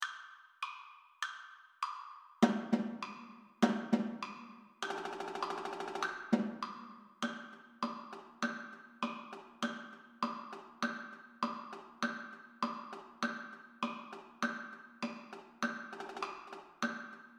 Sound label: Marching Tenor Drums Slower Tempo; Quads Only - Slower Tempo